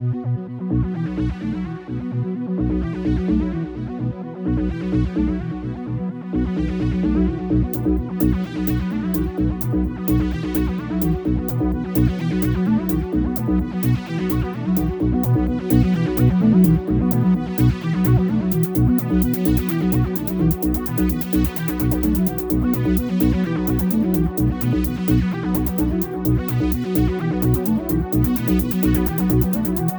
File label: arp